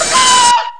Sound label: saw head; REDNECK; RAMPAGER